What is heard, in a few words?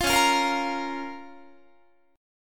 C sharp 6th C sharp Guitar; C#6; strummed; tuning=4A,4E,5A,5D,5G#,6C#; guitar chord